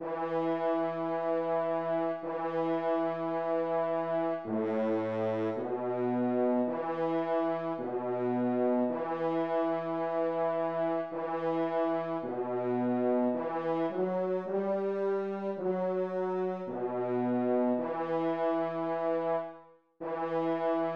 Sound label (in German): bass